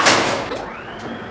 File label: LIFT CARS; lander